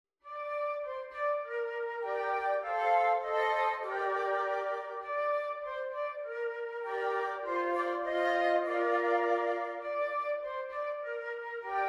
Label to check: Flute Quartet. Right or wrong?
Right